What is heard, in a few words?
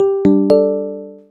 video chat outgoing; BlackBerry OS-10